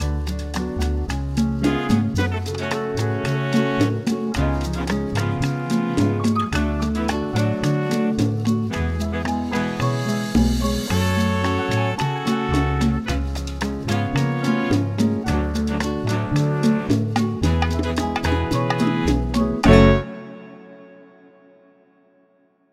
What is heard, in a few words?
minor key chord triples, F sharp; harmony